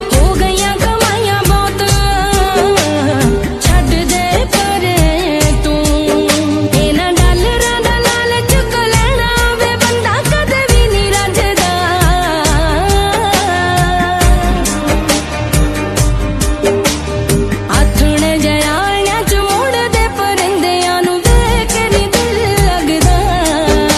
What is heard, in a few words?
bahrain punjabi ringtone